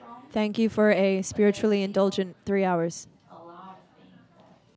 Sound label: Audience Recording; a-meal-premiere